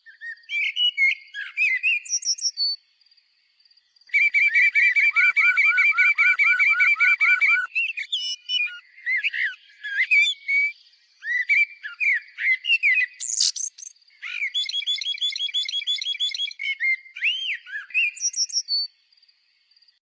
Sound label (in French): merle, oiseaux